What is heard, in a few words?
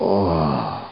DYING; BattleSNDS